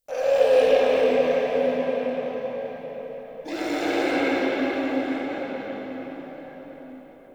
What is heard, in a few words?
Interior Texture Aggression Calls, Infested, Ambience; Misc Indoor